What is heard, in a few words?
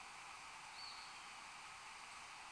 flycatcher